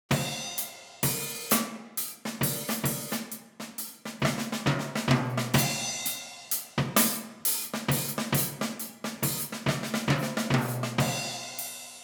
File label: ultramarine drums bypassed; ultramarine3